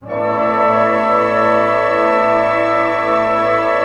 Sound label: orchestra chord cluster pentatonic; Roland LCDP08 Symphony Orchestra